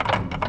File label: gear; Mafie 1